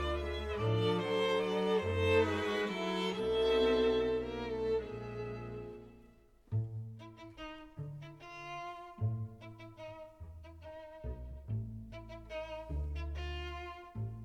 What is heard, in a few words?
Waltz, for tuba and strings